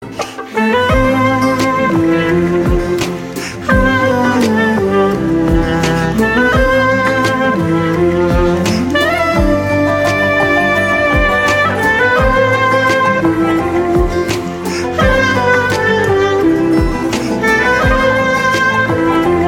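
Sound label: instrumental ringtone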